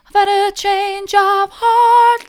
i've had a change of heart soft, vocal

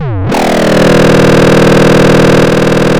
pop, chainsaw

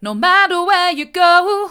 hard, vocal

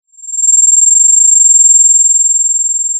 pop, Sound of a phone ringing

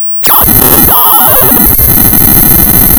Sound of tearing paper, center

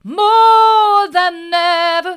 more than ever hard, vocal

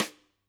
Sd St, drum

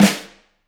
room Flam, drum

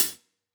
close Cl Hat, drum

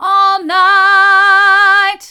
all night hard, vocal

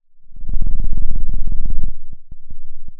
pop

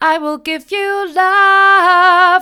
mid, vocal

